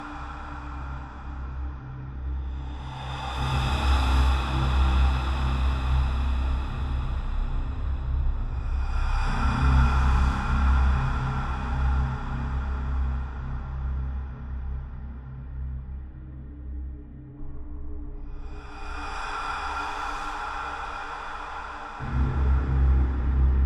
Horror Game Ambience Loop Ancient Curse Alt Mix Breath Airy Whoosh Wind Frozen Snow